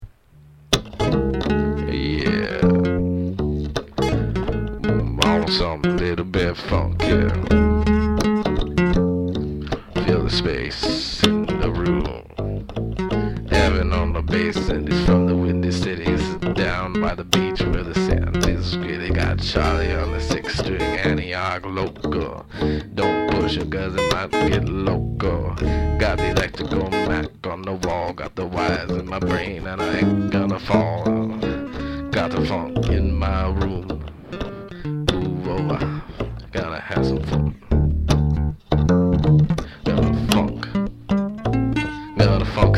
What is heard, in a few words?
lounge bit